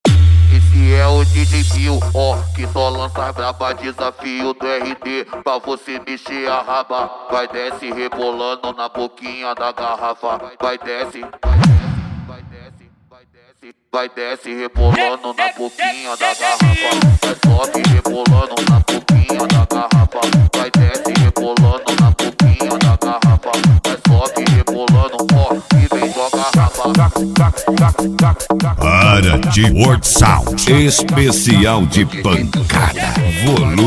esp pancada

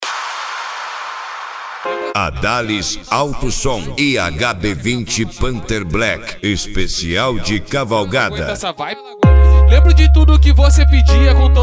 esp de cavalgada; adales auto som; hb20 panther black

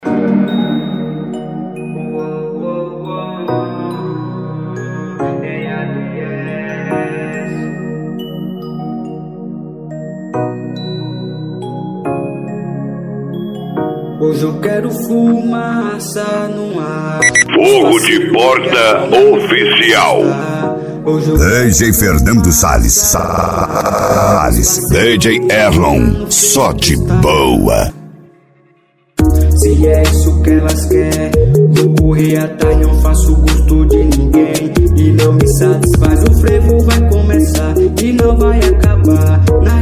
rap

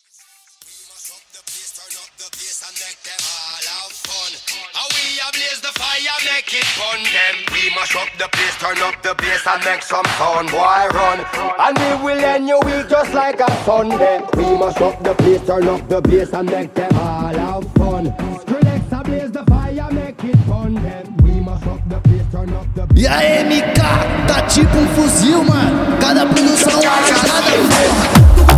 eletrofunk, versao funk rave

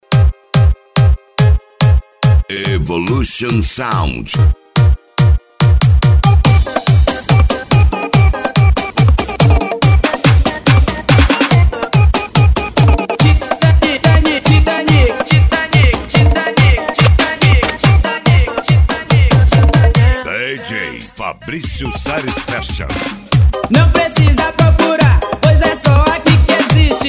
esp de pancada

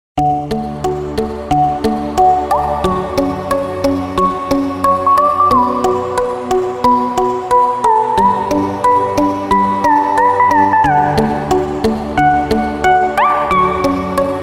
eletronica; cd bass extreme

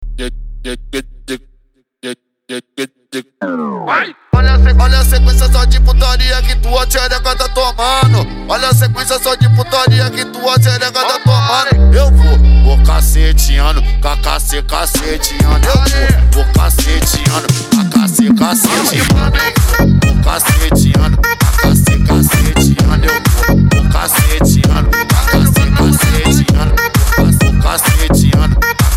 mega funk e funk mega bass

